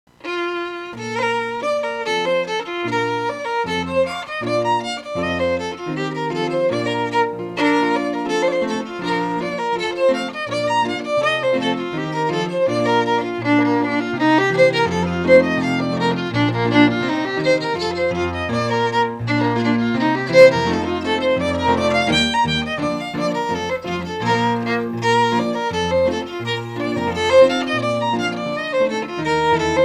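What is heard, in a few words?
Reel, Scottish Fiddling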